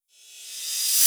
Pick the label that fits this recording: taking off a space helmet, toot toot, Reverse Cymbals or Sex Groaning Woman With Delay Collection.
Reverse Cymbals